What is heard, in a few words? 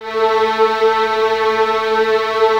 violins A 3, String Ensembles, 23 Violins; Miroslav Vitous